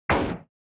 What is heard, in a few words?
Racing Collision; SNES